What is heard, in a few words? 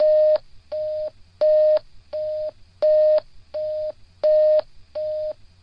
phone tone loop; SYNTH AND ELECTRONIC LOOPS